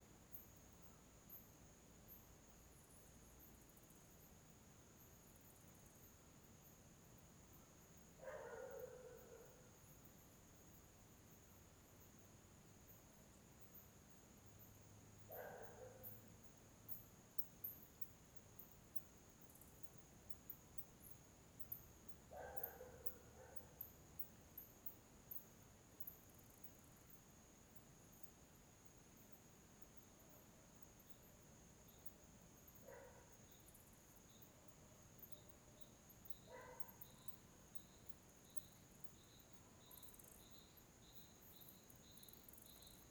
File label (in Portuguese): Ambiencia quintal de cidade pequena de noite com morcego, cachorro latindo; carro; ambiencia domestica